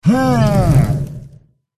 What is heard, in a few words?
dark prince attack voice bottom